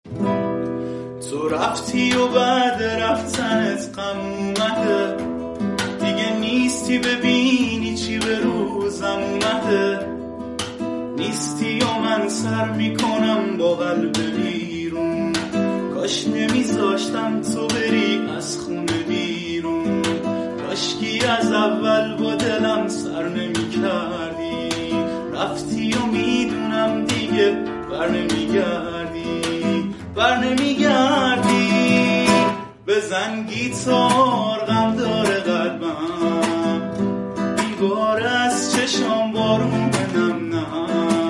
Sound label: Bezan Guitar